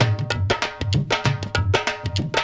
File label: Dhol; loops